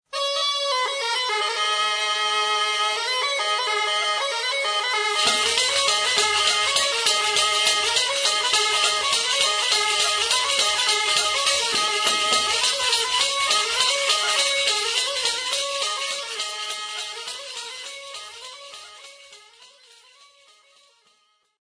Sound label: alboka; instrument